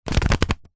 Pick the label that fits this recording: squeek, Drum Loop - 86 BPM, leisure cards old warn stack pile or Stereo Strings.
leisure cards old warn stack pile